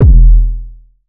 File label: 808 one shot E; Slate Digital BASSHOLE Sample Pack